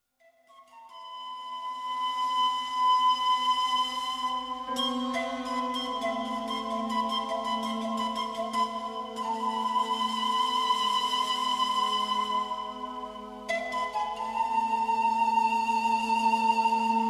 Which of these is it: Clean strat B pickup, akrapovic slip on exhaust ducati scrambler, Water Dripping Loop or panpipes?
panpipes